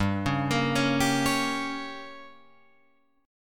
G Diminished, Guitar, Open F; arpeggiated; JGuitar

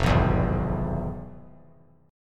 F Minor Major 7th Flat 5th; FmM7b5 strummed; JGuitar

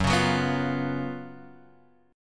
F sharp Major 7th Suspended 4th

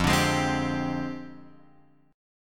F Minor 6th Add 9th F; Fm6add9 Chord {1 X 0 1 1 3} strummed

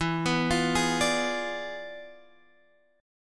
E 7th Flat 9th, chord; E7b9; arpeggiated; x,7,9,10,9,10

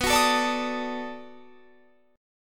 B 7th Sharp 9th, down

